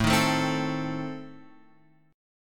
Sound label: A Diminished, Guitar Open F; strummed; JGuitar